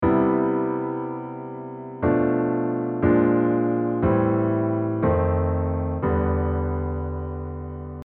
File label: ura chord